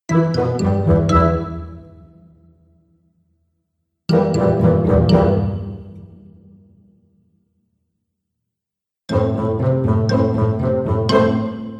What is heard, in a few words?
Cartoon Fun Musical Collection